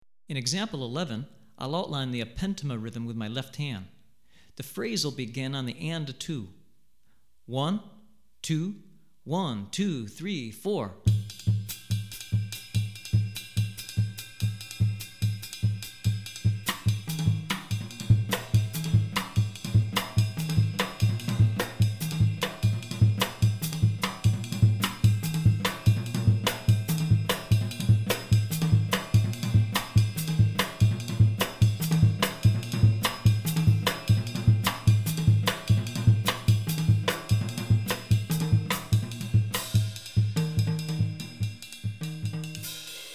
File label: Sikyi, Drumset Example, West African Rhythms For Drumset; Hartigan